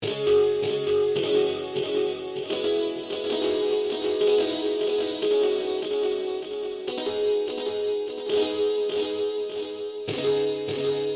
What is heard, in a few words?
buncha echoing guitars